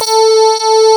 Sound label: digi decay